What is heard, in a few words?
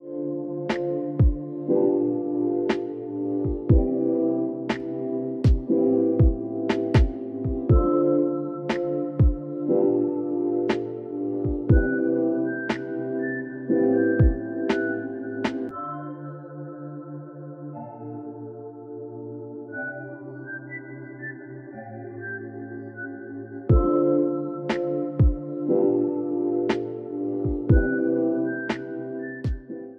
chill ringtone